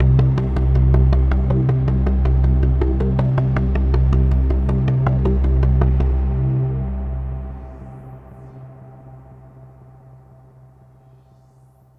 AMBNT 160; eJay; Ambient

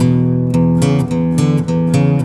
Acoustic Guitars; ACID MUSIC; Poe Dunk

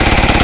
chaingun

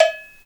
cowbell; metronome

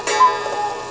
CUCKOO; My Science Fair

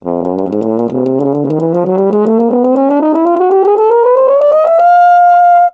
horn rang

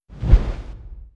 wings; Earth and Beyond; EA Games

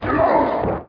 vocal

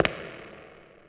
marble; ambient; Shadowman

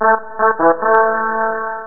FANFARE

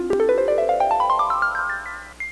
stereo intro; MacPROFF

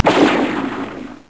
Shell Water; Stealth Combat

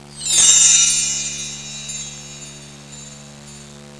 chimes; Sound Effects for Multimedia - Volume 1; electronic sounds

